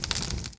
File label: fire pop; Tony Hawk's Project 8; XBOX